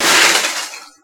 aluminium shake

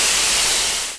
fire extinguisher stop; tools

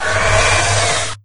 imp sight; doom3

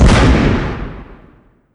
rocket fire; cyberdemon

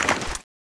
zoom; cso; x-12

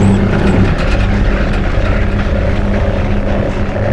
elevator loop

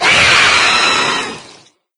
range attack; doom3

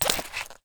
holster in, firearms

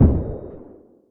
turret missile launch far

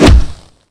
foot kick body

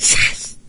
melee, maggot; doom3

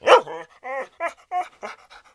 panic, dog

